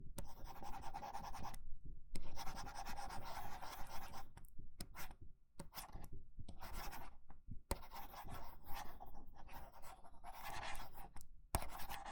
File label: Drawing On A Graphics Tablet